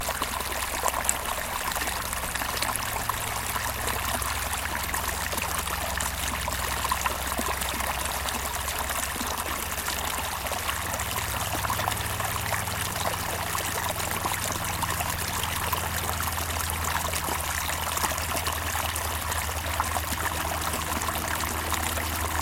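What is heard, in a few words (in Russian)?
ручей на открытом воздухе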